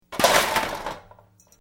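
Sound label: Metal Medium Impact Settle, Noisy metal junk impact, fall and settle; Tools or metal parts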